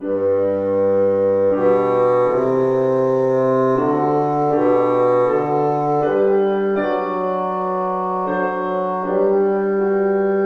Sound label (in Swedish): bas; valborg 2014